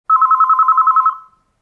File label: ring; CLANNAD FULL VOICE; Key